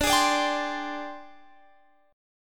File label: Ab Major 7th Flat 5th D, Guitar